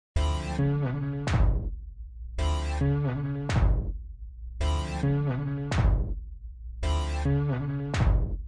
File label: widdly, synth and electronic loops